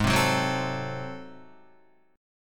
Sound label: G sharp 7th Flat 9th G sharp down Guitar, chord